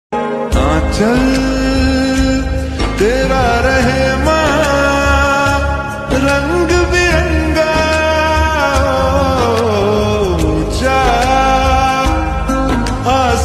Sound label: ringtone desh bhakti ringtone indian army ringtone